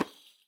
RIQ SLAP; SoundBytes - Early Patches

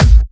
Dubstep Kicks

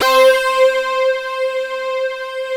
ARP, Analog Fantasies; USB Soundscan vol.49; AKAI

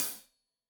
Hat Loose, Hi-Hat, Cymbals Stereo

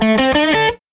Strat Guitar Loop

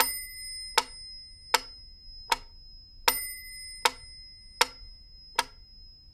METRONOME 80; AKAI S-Series CD-ROM Sound Library VOL-6

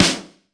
SN, Casio CTK-5000

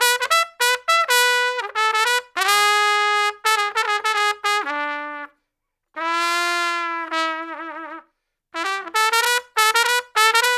Trumpet Solo, Jazz; Traditional; Sonic Foundry (Sony Creative Software); Jazz Solos and Sections

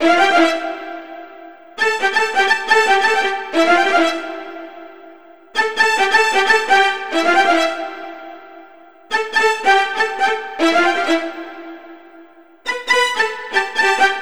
String Lead, Electro Lounge; Copy Show String Lead; Sonic Foundry (Sony Creative Software); 135 Funky Air

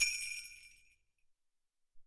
Sleighs

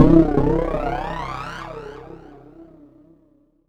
C1, SynthLine; 90 s Sample CDs; NiceBeats; SynthLine VOL-1

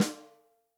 ROCK SNARE CHROMATIC, Real Drum Kits

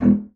bassoon E1; C BASSOON; Xsample VOL-6

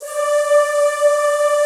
ZZZ D4, Classical Choir; Best Service; Extended Classical Choir

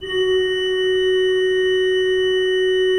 SWL F3, Cathedral Organ, SWELL WERK; Propeller Island

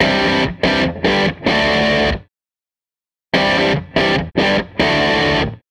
Les Guitar Loop

